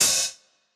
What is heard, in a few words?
hihats open adult